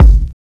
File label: Kick, Kicks